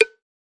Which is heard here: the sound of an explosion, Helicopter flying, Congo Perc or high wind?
Congo Perc